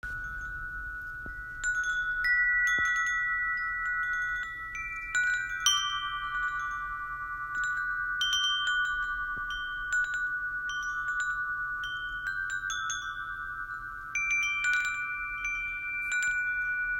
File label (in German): Klangspiel Happy Birthday; windspiele